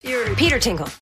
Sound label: Tingle